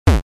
Retro 8-bit damage

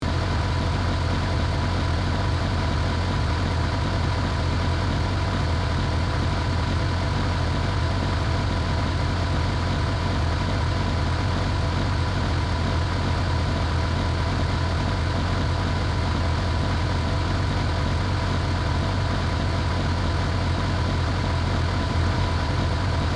Big truck engine steady high rpm loopable

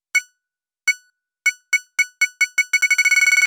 Bomb digital beeping timer; Explosions

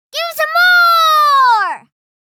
Excited vocalization girl kid child

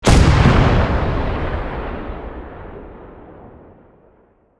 Armored tank cannon fire single shot

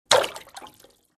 Pooping poop defecation ploppy drop

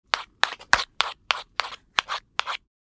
Matches box match striking

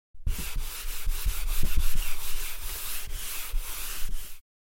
Chalk erasing on a chalkboard

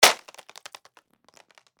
Blood or gore splatter plastic surface

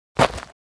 Footsteps single step on sand with pebbles

